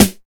Jungle Snare; Snares

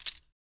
jingler; Spectrasonics; Liquid Grooves 1 & 2; METALS

